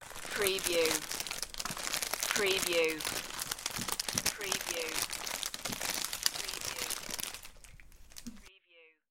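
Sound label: Crinkling Cracker Bag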